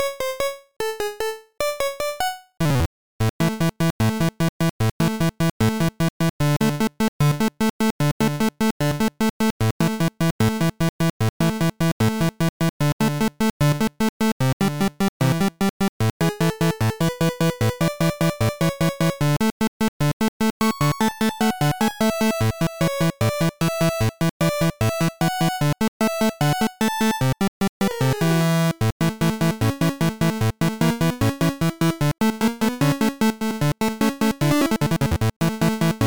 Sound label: Video Game Stage Karaoke; pinky street kira kira music night pop town gamerip